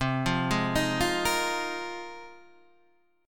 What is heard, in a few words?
Bb 7th Suspended 2nd Suspended 4th C